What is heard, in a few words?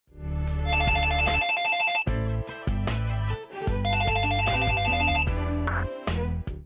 ringer, ringtones